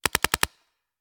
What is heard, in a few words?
Paintball Gun; Sound Ideas; Guns